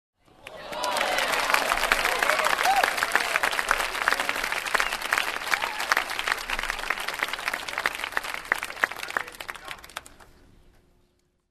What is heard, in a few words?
Astonished fans applause up-close